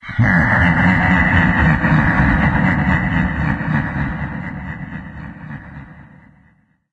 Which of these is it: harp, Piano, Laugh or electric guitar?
Laugh